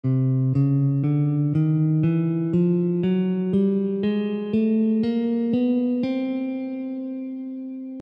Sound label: chromatic